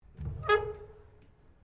Clothes Dryer Door Squeak, Domestic dryer; Laundry; Household; SoundEffects Foundation2

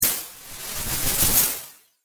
Airy Hit And Flutter; Hit Sound Effects; impact sound effect; Andrew Fleming

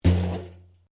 Pipe Tin Move; Material components to create more complex sounds; Materials; Metal

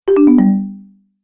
Message Alert, beep

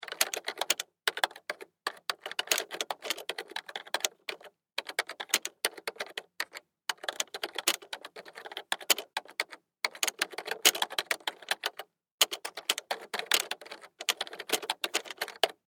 Technology Computer Keyboard Type Long; Typing (Sound Effects:Offices)

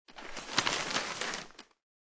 Nwspr Opn, Newspaper opened up and rustling; Crackling (Sound Effects:Objects)

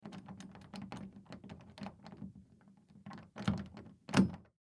Lock Pick Door, Lock Pick Door Key; Police Cop Burglar; Emergency; Locks (Sound Effects:Objects)

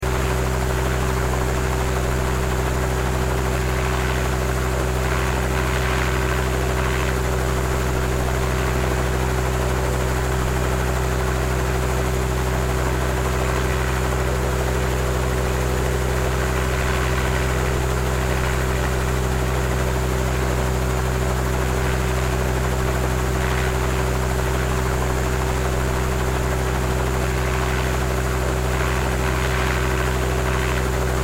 Helicopter Ext, Helicopter Exterior Blade Loop; Helicopters

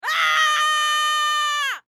Human Female Scream Long; Screaming (Sound Effects:Voice)

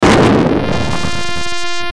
Hit Crackle Glitch Electrical Hum; Production Element Imaging Element Accent Transition; Hit Sound Effects

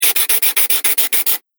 Pencil Scribbles On Paper; Writing Sound Effects; Foley Sound Effect

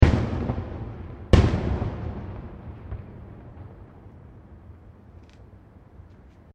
Fireworks Multiple Explosions; Backgrounds; Crafted Signals

